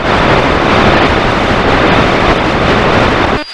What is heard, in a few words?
edacs provoice